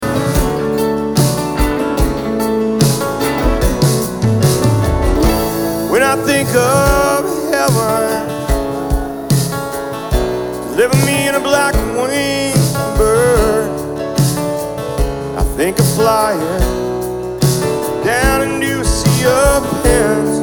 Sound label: Acoustic